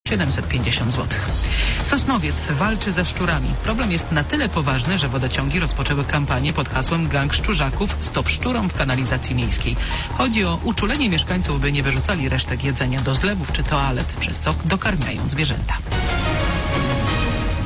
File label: ROCK RADIO